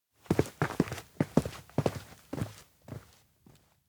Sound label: steps outdoor plaza; ken; anemoi